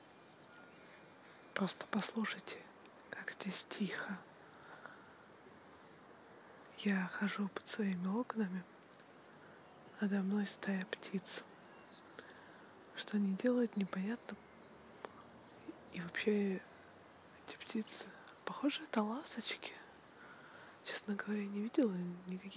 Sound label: birds